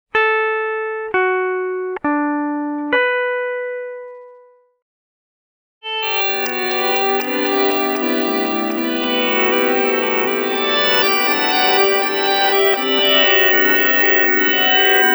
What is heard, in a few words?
Swell Plus MIDI Sequence; Hedra